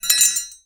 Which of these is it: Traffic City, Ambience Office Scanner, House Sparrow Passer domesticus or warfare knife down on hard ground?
warfare knife down on hard ground